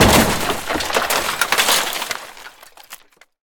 metal debris

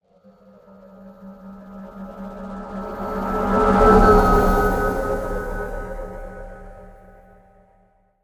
spacecraft passby, Alien Spacecraft; BlueZone

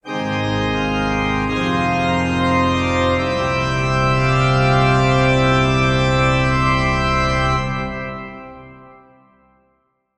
Discovery Organ WET; InspectorJ; RPG Orchestral Essentials (Music FX)

